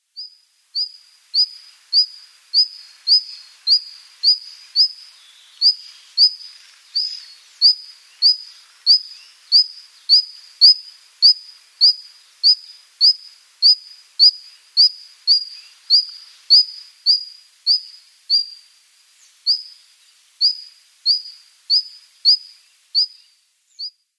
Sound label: Red-flanked Bluetail calls